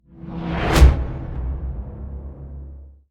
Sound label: Riser Hit